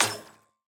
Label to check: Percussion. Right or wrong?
Wrong